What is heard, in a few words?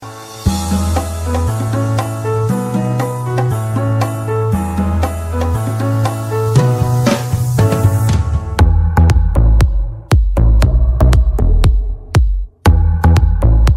soft touch ringtone